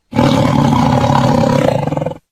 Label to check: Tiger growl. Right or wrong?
Right